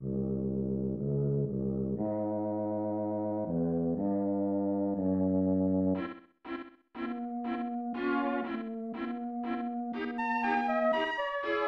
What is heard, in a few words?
Horror; Wondrous Magic 1993 SNES